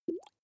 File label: small bubbles single soft